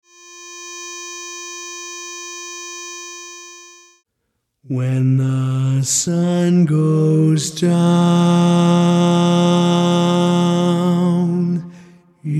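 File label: Baritone, barbershop tags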